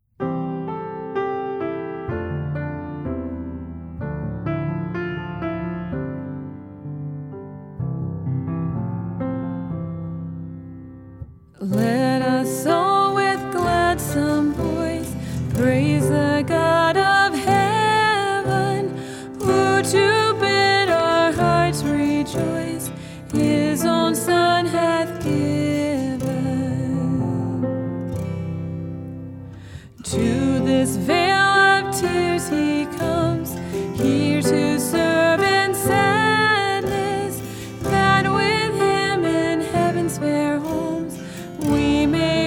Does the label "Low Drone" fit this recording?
No